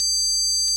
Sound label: ORG VOX Continental, Keys of the 60s and 70s; Roland LCDP09 Keys of the 60s and 70s 1